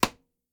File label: arrow, attack impact